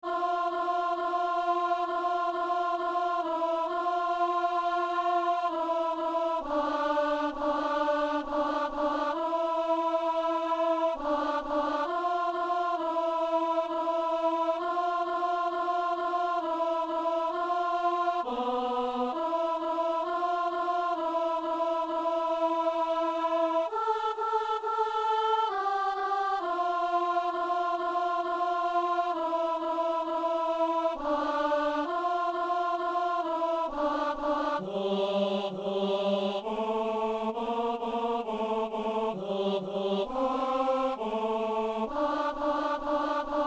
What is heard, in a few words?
alto; Notre Père (Maurice Duruflé)